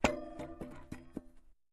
Axe Falls To Ground

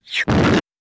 Rock Falling Whoos; rocks

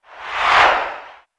Football Crowd Dopp, sports arena football

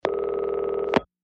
Tel Inline Ring, futz pulse rotary rings

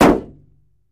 Metal Hit Car Body, small impacts

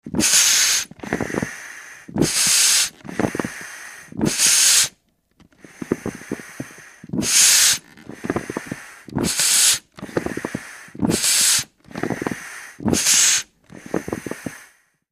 Bellows High Pitched, air

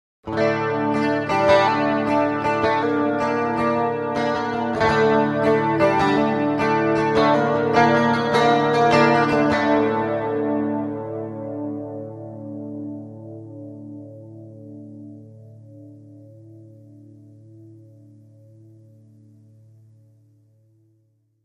Electric Guitar Flanger

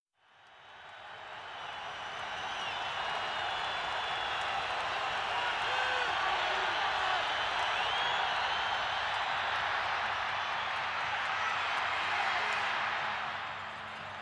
Football Crowd Exci, sports arena football

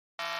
Fuzz Beep Shrt Disto, beeps

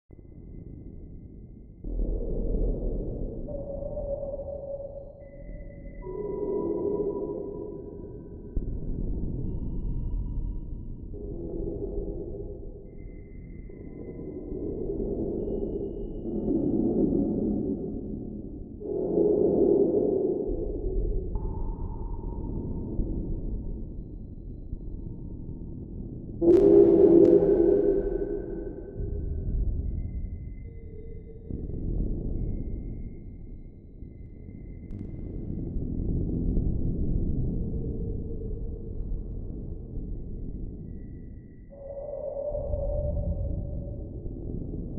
Space Walk Breathy Amb

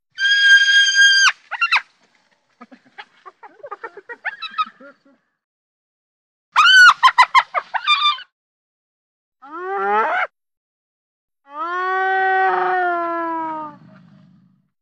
Hyena Unknown Type Wh

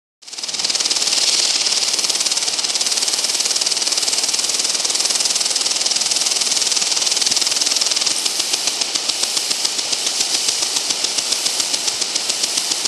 Sprinkler; Rainbird; Rainbird Water Sprinkler.

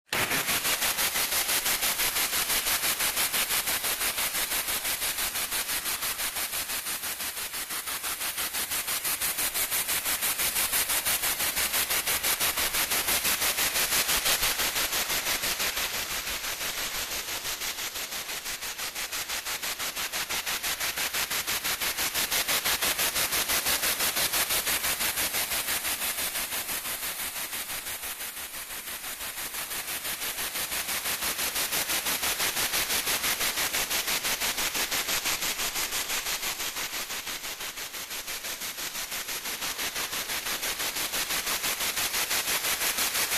Lawn Sprinklers, sprays & hoses; Lawn Sprinklers, Panned

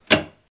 Door Latch Click

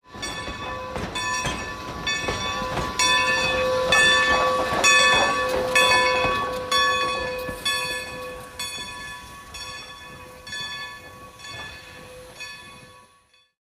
steam train bell

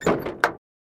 Cessna Door Open

